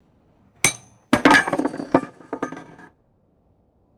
heavy metallic object dropped on concrete

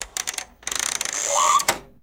rapid winding of a mechanical clock

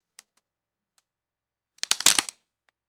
thin wood snapping and splintering

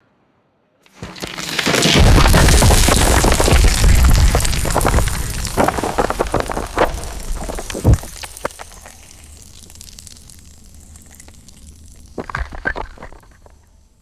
rocks tumbling down a deep resonant hole

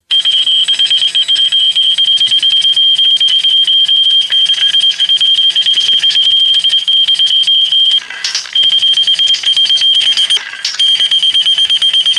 rapid high pitched electronic alarm; alarms

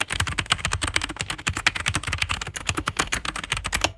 rapid plastic computer keyboard typing

